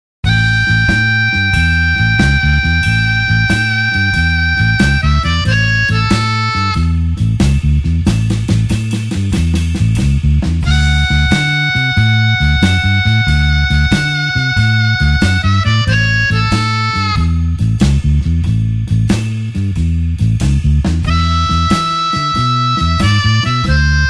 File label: blues harp shuffle A